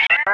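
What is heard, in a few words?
sphere blips medium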